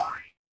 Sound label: cartoon boing